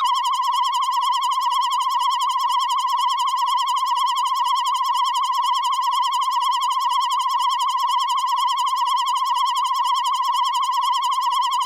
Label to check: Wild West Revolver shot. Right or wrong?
Wrong